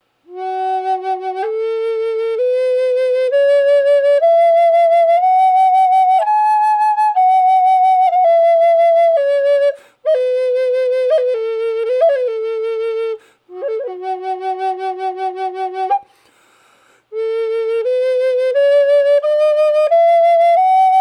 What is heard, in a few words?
movingui f sharp dry, flutes